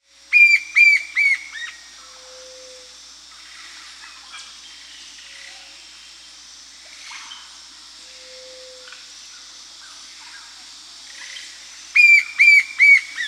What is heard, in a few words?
Cream-colored Woodpecker (Celeus flavus), Peru; S. America